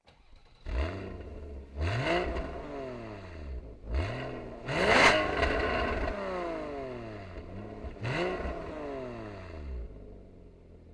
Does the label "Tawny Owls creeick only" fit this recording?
No